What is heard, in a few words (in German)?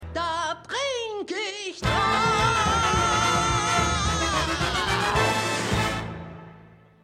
Trinkerin Gesang; Schmigadoon